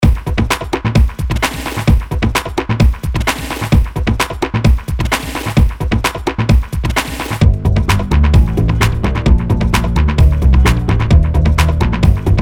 Acid House

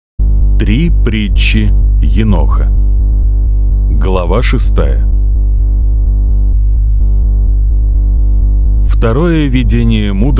synth mix; книга еноха гл. 6.

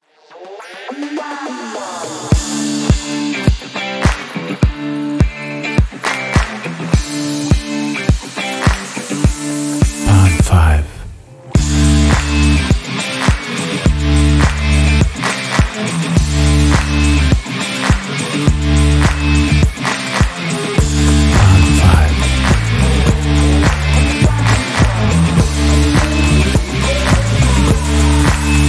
Exotic Dance Uplifting Rock

